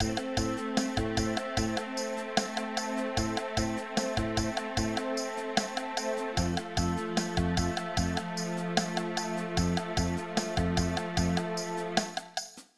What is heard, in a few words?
stem drum